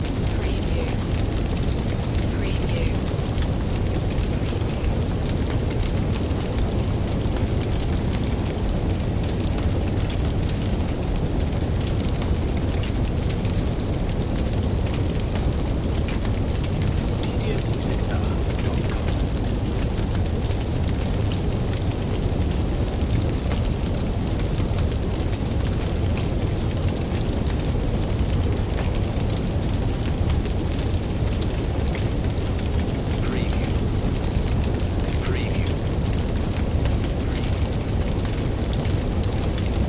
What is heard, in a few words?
Fire Flames Heavy